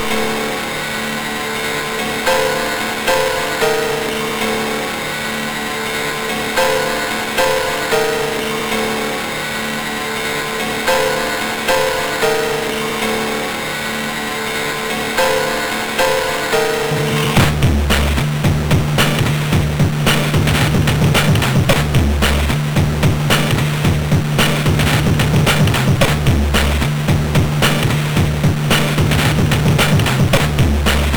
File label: Theme, MOS6581R4